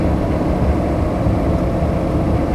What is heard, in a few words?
MOTOR RPM 4X4; SIMUZENSOUND